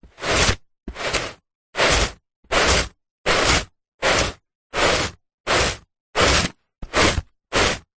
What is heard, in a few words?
household dustpan brush sweeping carpet several slow sweeps into plastic pan; zapsplat